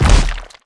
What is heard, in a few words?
hit; DayZ-Epoch; dayz sfx